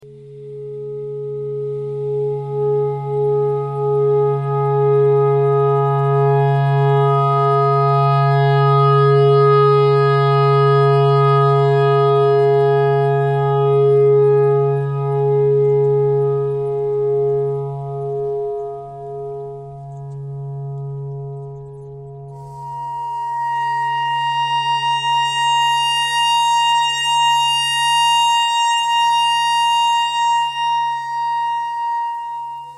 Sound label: clarinet notes e clarinet thread pentatonic